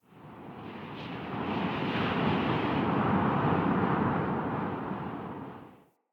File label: Under City Wind Heavy Fast Gust; Ambience